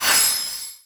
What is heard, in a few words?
wild Transform Flash; netent